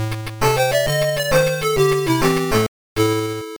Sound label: Death; Shinobi Master System